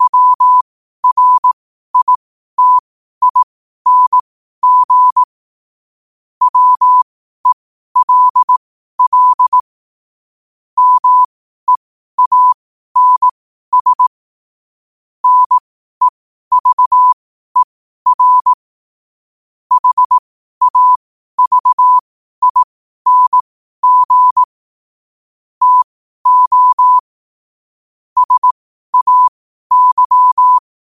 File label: 5 wpm, morse